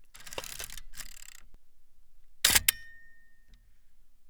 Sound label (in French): OBTURATEUR 8s MAMIYA RZ67